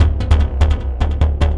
Synth Bass

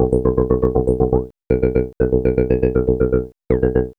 POLYMOD; AMG - Vince Clarke Lucky Bastard

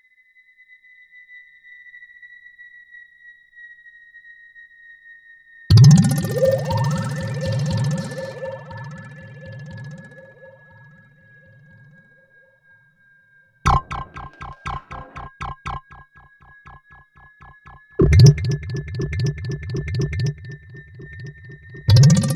Percussion Sounds With Delayed; Bizarre Planet

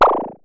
Synare Filter; Star Instruments Synare 3

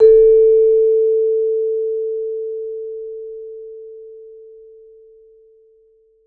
VMED A3, VIBE MED MF; Marimba & Vibes; Sampleheads; Dave Samuels

